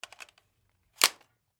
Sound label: reload